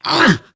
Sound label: Pain; Warframe; Grineer Catbrow Handler; Battle Barks